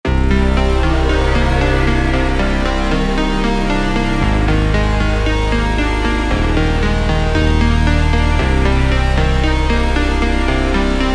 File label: backing track